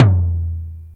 low mid tom drum e key, Royality free tom sound tuned to the E note. Loudest frequency: 296Hz; tom drum samples